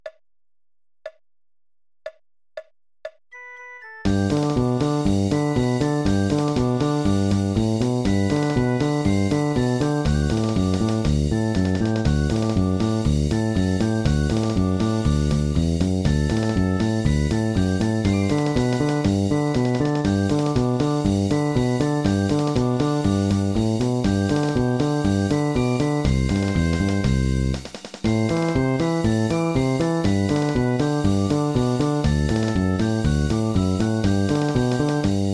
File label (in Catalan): acompanyament amb flabiol